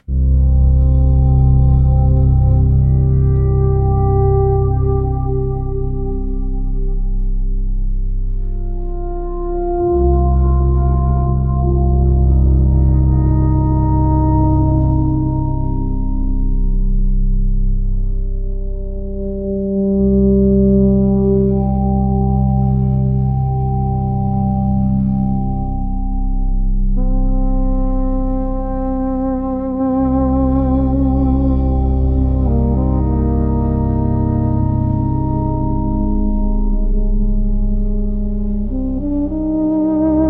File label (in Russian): atmosfernyj fon dlya diktorskoj rechi